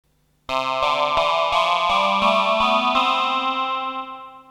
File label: vocal